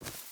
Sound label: footstep boots grass run; Rust